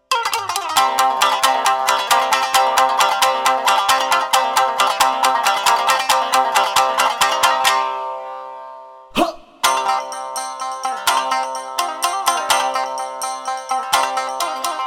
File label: shamisen sample